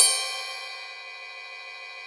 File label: Ride Bell